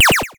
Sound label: enemy shoot; sky knight